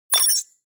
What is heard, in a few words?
sci-fi click; mixkit